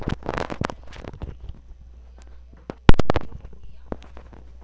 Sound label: scratch